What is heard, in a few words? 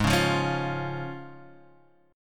F Minor Sharp 5th G sharp